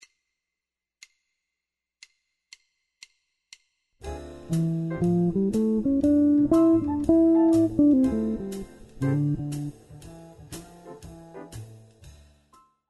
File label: jazz guitar improvisation; practice routine